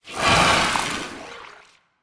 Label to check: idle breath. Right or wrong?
Right